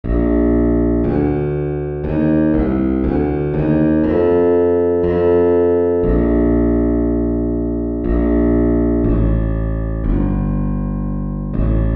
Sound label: bass